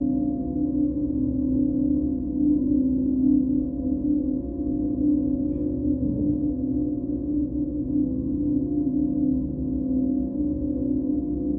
Ambience Machine Dr; drones & tones

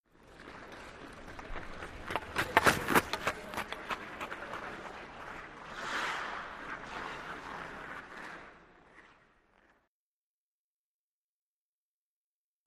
Hockey Fast Skate By

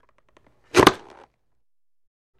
Football Helmet

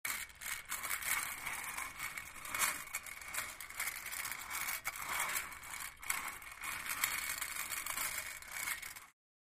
Casters Metallic, wheels

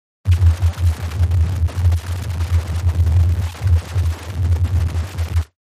Distort Thumps, distortion

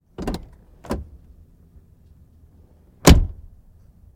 Expedition Front Doo, ford expedition

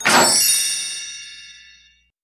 Switch Floodlight; electronics

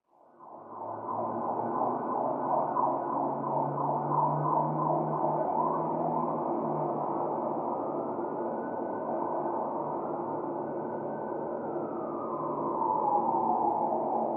Traffic Medium City

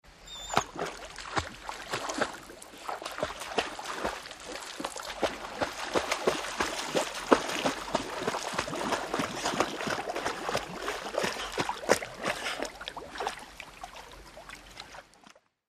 Dog Swim Whine Enter W

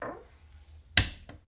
Door Cupboard Close

